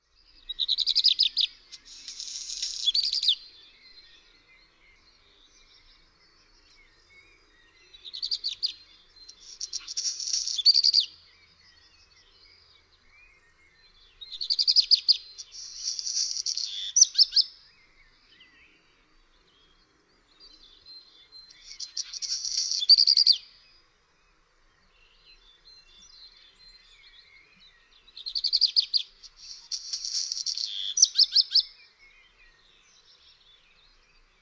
KOPCIUSZEK, birdsounds

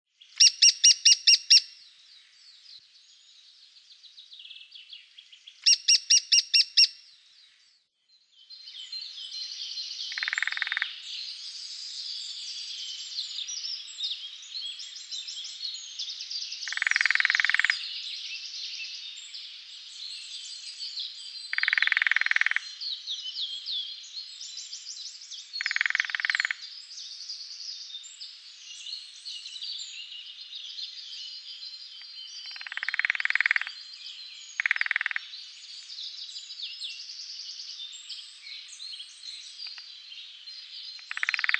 dzieciolek

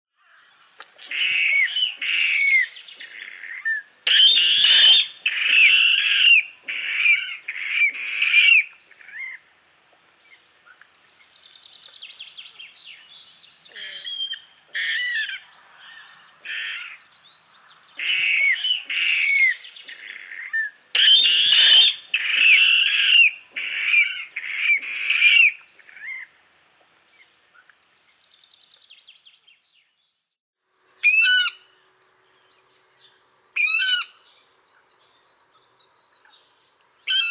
wodnik, birdsounds

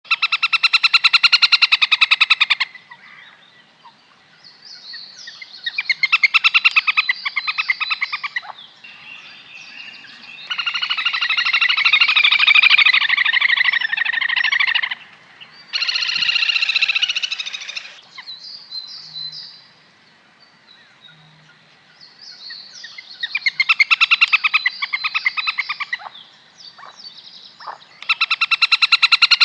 perkozek

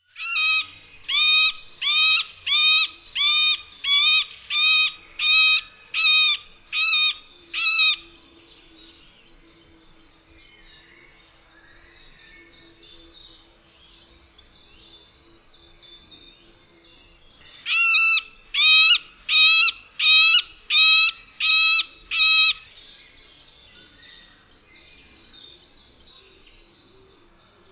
dzieciol sredni, birdsounds

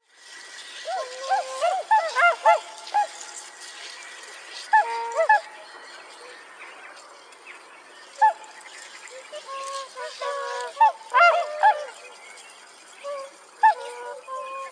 lebedczarnodzioby

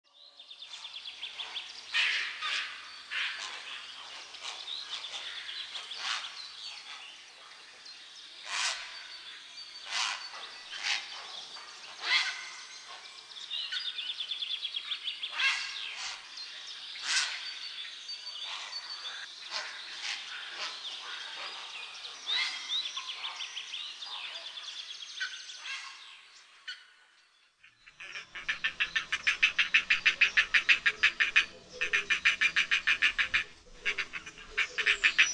czapla siwa, birdsounds

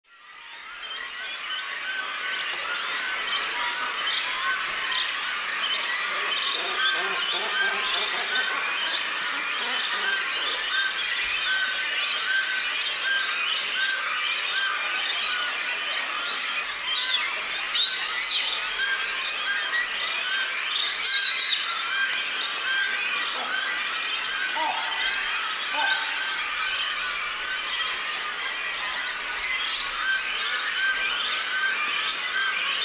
kormoran, birdsounds

